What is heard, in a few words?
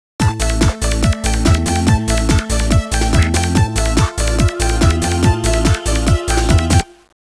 groove